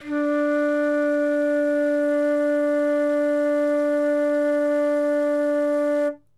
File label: Wodden Flute D2